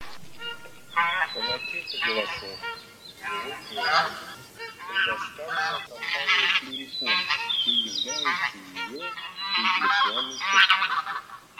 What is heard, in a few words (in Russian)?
Гуменник Oca Granaiola; plurifon